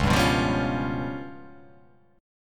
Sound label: C sharp 7th Flat 5th, chord